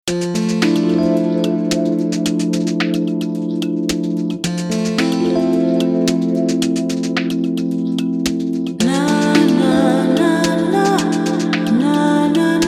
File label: Double String Mix